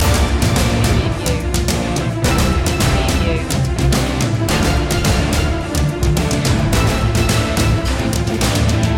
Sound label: battle in 8-bit space loop